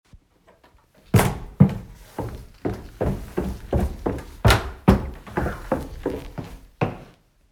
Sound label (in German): siebtes Geräusch